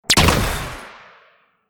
fire, weapons; light rifle

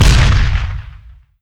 fire, weapons; gtav

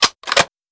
rifle deploy; rifle desert, gunother; weapons

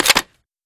rifle clip in, weapons; rifle desert, gunother

